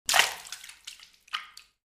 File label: water splash